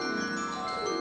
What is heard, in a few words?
Jackpot spin